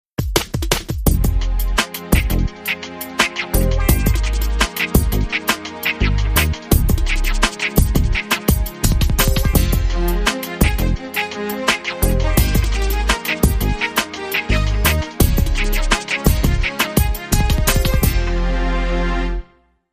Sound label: R&B Flavor